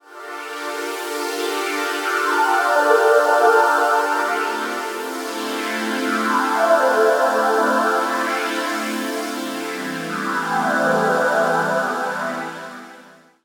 Saw Pad

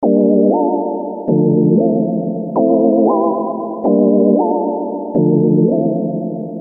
Sine Wave reverb

